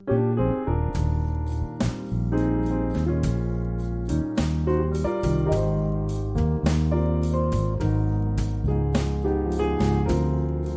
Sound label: Trio